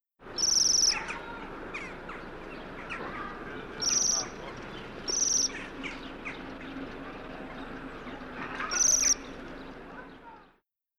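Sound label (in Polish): jemiołuszka; szesciolatki; karty aktywnosci glos jemioluszki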